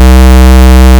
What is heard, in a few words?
rasp